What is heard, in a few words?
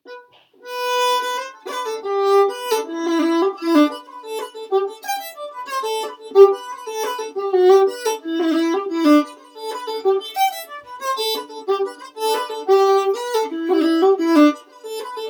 3x extra A 90bpm